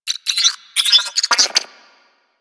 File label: Computer Beeps; Warframe; Guild